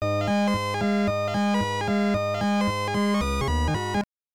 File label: Game Start; Namco Classic Collection Vol. 2; arcade; Namco ND-1